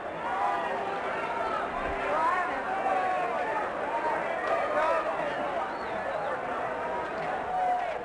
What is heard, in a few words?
Intro Amb; Levels Hub Restaurant; Intro Amb Walla